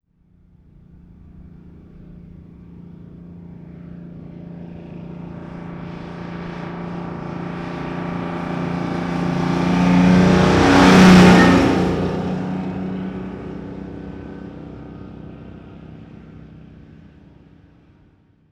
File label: 1948 Diesel Truck Ext Fast By; Period Vehicles